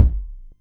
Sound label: Kick, Roland MC09; Drums